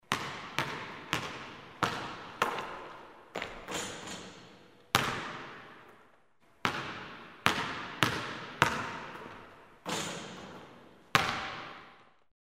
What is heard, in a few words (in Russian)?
brosok basketbolnogo mjacha